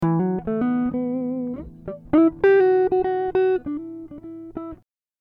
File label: Em 100 GTR Riffs